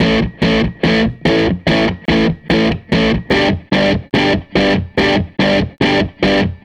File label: Les Guitar Loop